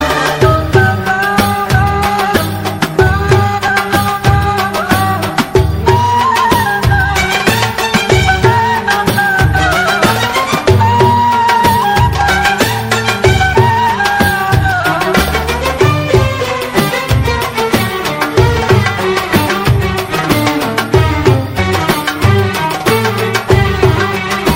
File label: egyptian ringtone